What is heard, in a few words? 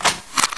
m4a1 silencer off, weapons